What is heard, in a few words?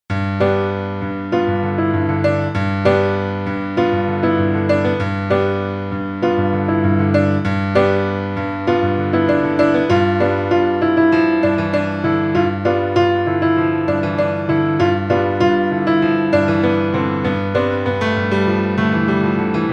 piano